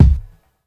bassy rap kickdrum sample c sharp key

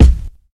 kick one shot b key

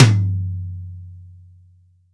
acoustic tom g key; tuned drums g key

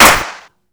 short reverb snare D# key; snare drum samples

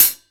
present pedal hi hat sound g key

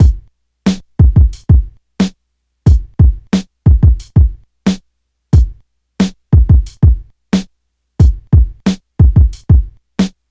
90 bpm drum loop sample B key

